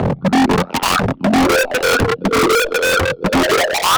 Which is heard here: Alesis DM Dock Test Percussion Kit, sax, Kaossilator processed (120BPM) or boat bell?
Kaossilator processed (120BPM)